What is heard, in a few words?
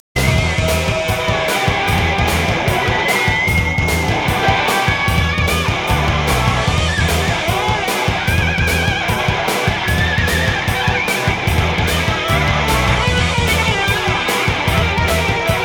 Guitar Riff; Power Rangers Zeo Power Words